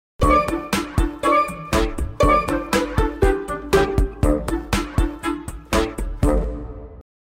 Enfant histoire link; Jingles medley

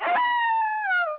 coyotte

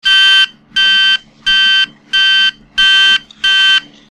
Alarme

